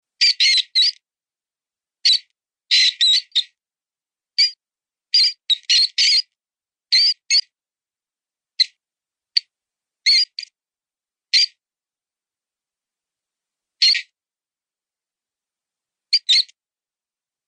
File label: Mouse Sound